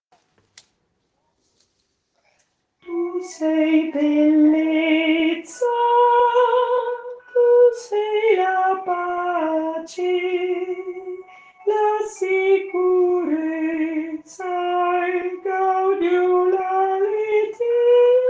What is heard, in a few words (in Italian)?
soprani; lodi all altissimo